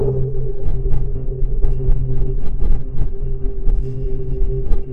heartbeat

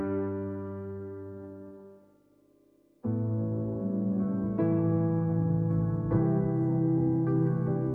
sad piano; sound effects

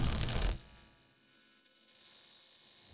air horn

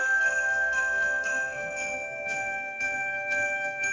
bell sparkle

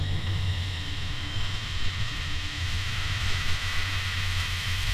riser sweep; sound effects

